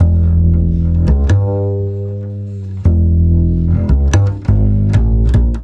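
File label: 085 Acid Jazz Doublebass E major; Pro Tools Essential Loops; Digidesign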